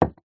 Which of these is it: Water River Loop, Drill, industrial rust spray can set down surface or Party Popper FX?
industrial rust spray can set down surface